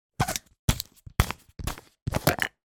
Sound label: household flashlight torch small head cap unscrew; sound effects one